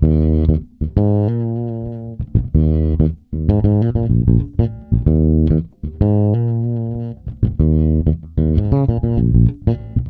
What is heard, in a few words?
D#, funk, bass; Spectrasonics; Bass Legends